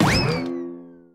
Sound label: bonk